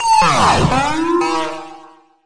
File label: screaming blarp down, Synth